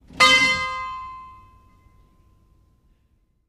Small New England, bell towers

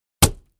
Axe Paneling 1 Side, axe hits

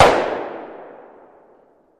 Gun Shot Sngl Fire

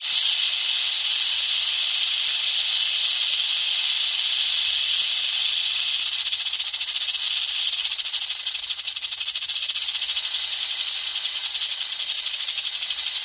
Rattlesnake Tail Rattle

